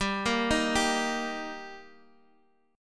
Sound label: G Minor Sharp 5th G Guitar Standard, chord; arpeggiated; JGuitar